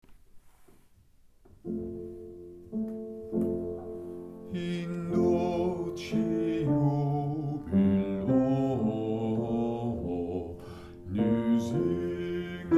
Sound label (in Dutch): bas in dulci, geluidsbestanden in dulci jubilo om te oefenen